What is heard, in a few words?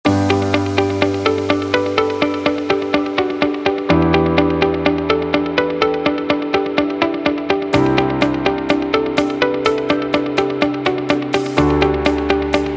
Study